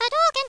fireball; Worms DC

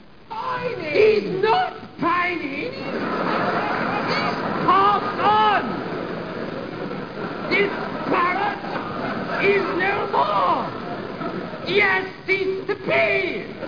Parrot

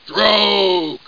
Stroke; attic

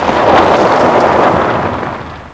SKID; mtm2demo; PC Gamer 4.2

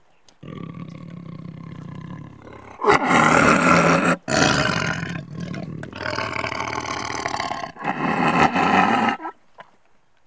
tiger; Sound Effects Library 1.5

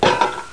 Metal

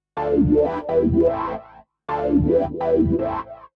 SYNTH, Keys; eJay

